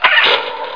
Fast Car Starting; Transportation; Sound Library 2000; Wayzata Technology

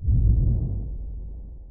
thunder; Mistergenerator

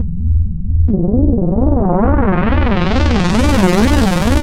buzz, Synth

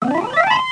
gong; MOBICLIC 13; quiz

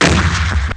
Woosh; Play&LearnRC

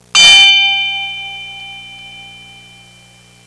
swiss bell; Sound Effects for Multimedia - Volume 1

